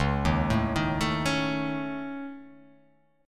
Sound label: C sharp Suspended 2nd Sharp 5th C sharp